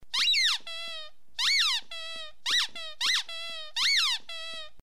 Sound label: Boob Squeak Sound